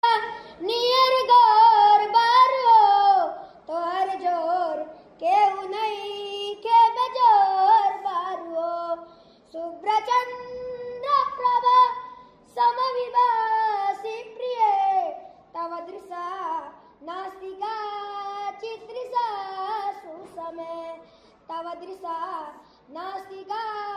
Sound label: romantic sanskrits ringtone